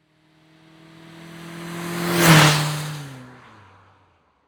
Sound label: Auto Saturn Drive By Fast; Transportation